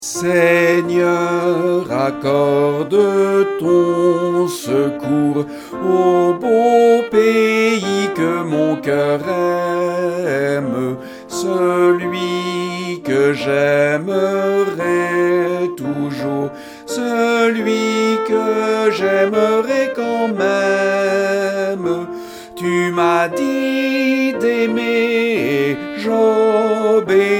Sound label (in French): priere patriotique chant sax; fete nationale